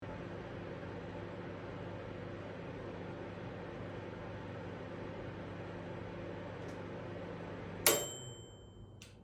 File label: retro